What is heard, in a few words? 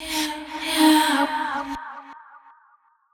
2 vox, Vocals; TRUTH-HZ; Crowdsourced Sample Pack